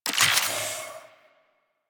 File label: Infuse Gas UI; Warframe; Warframes; Alchemist